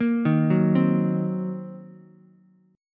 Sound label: Eb Minor 6th Ukulele, Ebm6 chord; arpeggiated